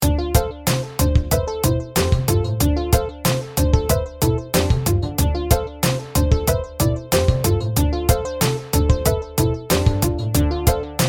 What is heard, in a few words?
background music, instrumental